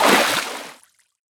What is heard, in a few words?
Small Water Splash